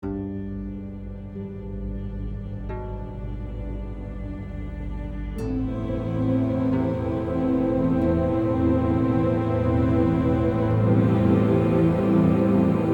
Tense Loop

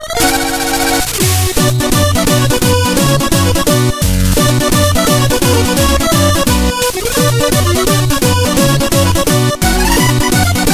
Music Mini Game; Shovel Knight King of Cards

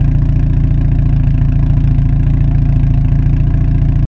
Aston Martin Vantage Idle; car simulator 2

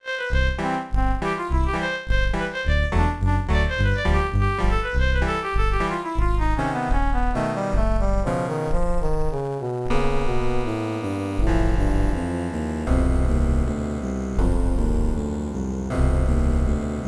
Pirate Ship Cutscene (Sailor's Hornpipe); apple iigs; King's Quest III: To Heir Is Human

